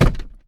Gearshifting; gamerip; Car Simulator 2; android

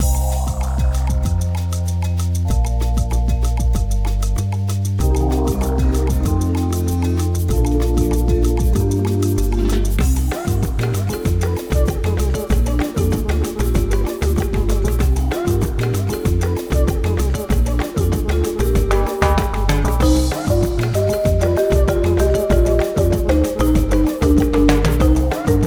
Shuffleboard (Jungle Bumper); madagascar; ps2

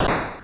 Splash

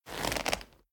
Leather Foley; Devour; The Town

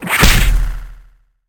Punch

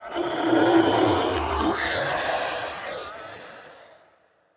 Ghost Vocal Fly By; Devour; The Town; Intro Sequence; devour 2021 gamerip pc macos

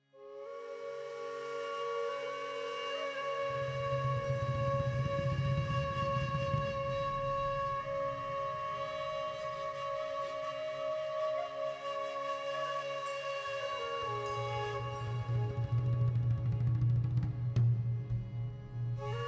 Celtic Air; Total War Rome II

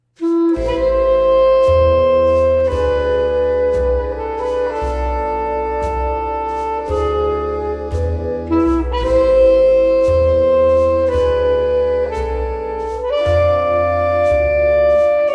Ui Music; Nancy Drew: Danger by Design

